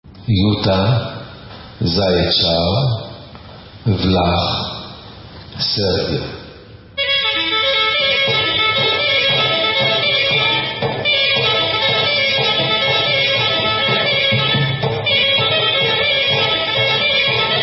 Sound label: Juta, Serbian, Instrumental